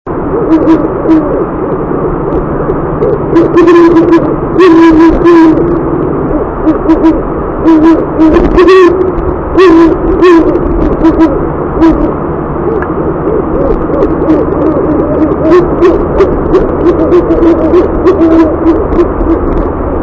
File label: owl great horned courting; birdsongs